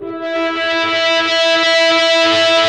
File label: SYNTH GUIT; Mostly Leads